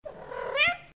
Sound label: meow victory